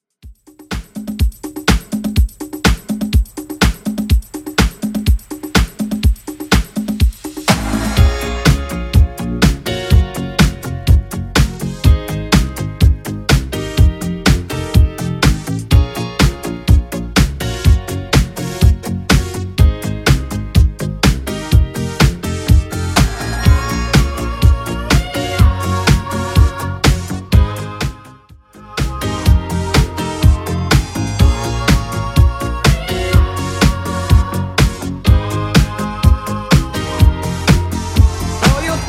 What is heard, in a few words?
80s Redrum 124bpm